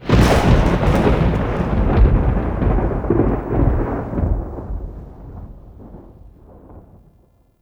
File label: Plains Thunder Clap; Warframe; Eidolon Plains; Ambience